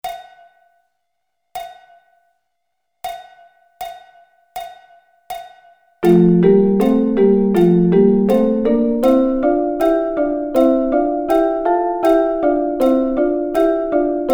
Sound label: Double Stops